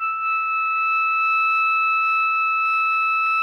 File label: Soprano E 5, Saxophones; Greytsounds; Philip Wolfe David Crozier's Saxophones